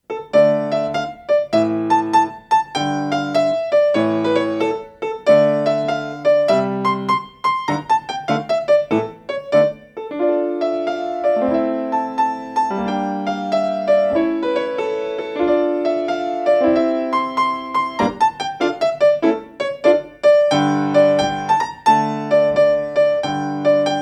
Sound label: Jig, piano